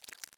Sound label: slosh